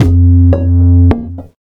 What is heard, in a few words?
outro